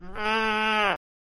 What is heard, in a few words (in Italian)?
Pecora